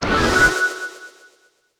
SFX - Use Bonus; uno

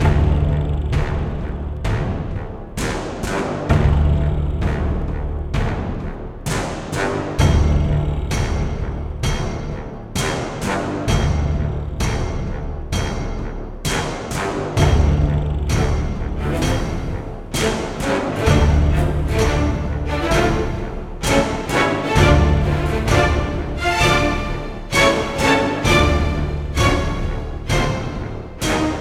Boss Steel Enemy (Looped)

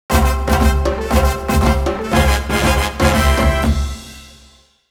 Event Clear

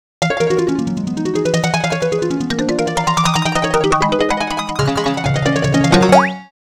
Leaf Slider

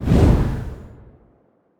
Fire Ignite

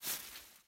Plant Move

